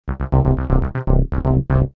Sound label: Jon bass sequens 120